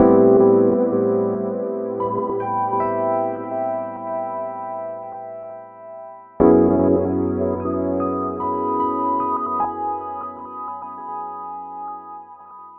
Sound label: Piano Lo Fi